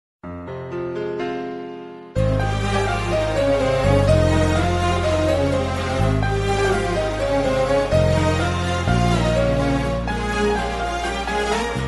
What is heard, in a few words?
Arab Music